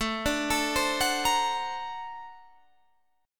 Bb Augmented Major 9th A

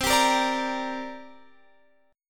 C 7th Suspended 2nd, Guitar, Drop D

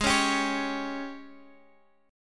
Ab Major 7th Suspended 4th Ab Guitar Standard; strummed; x,x,6,6,8,9; AbM7sus4; JGuitar

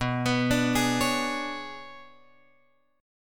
B Minor 6th Add 9th B; Bm6add9 Chord {7 X 9 7 9 9} arpeggiated

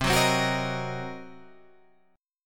C Minor 7th Flat 5th Guitar Half Step Down, chord; Cm7b5

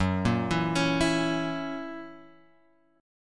F sharp 7th; F#7 Chord {2 1 2 X 2 0} arpeggiated

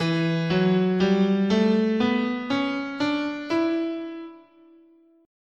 E Dorian, guitar, scale

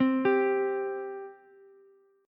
C 5th C Ukulele; C5 arpeggiated

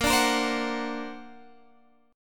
D Augmented 7th, chord

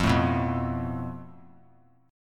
F Major Flat 5th; FMb5 strummed; JGuitar

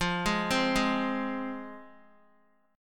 F Minor Guitar, chord; arpeggiated; x,8,6,5,8,x; 4E,4A,5D,5G,5C,6F

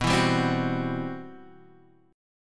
C-Major Sharp 11th B, guitar

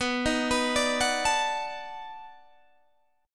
B 7th Sharp 9th, down, Guitar, Open G

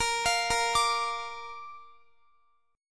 A sharp Major, Guitar; arpeggiated; tuning 4F,4A,5C,5G,5C,6E

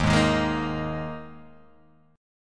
D sharp Suspended 4th, Guitar, Half Step Down

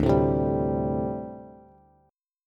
D 7th Suspended 4th D Bass; strummed; JGuitar

